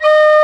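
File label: penny whistle, World Winds; E-MU Producer Series Vol. 7 – Old World Instruments; World Combos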